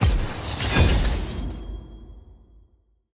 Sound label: ready check accept button click